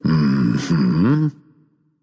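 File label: non verbal agree, voice; cernunnos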